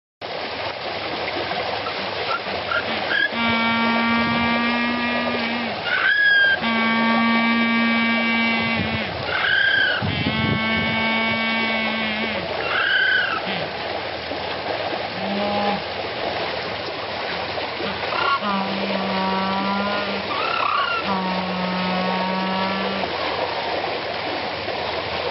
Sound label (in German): pinguine